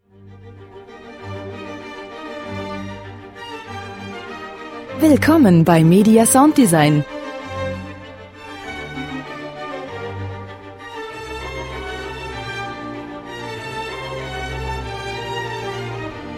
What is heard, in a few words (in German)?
klassik